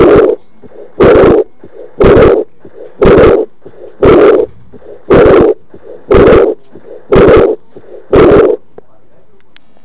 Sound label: Aortic Insufficiency (AI)